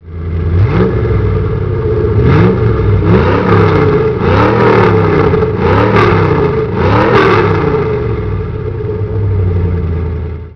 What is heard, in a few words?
isogrifo 57